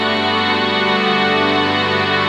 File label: Strings Bmin7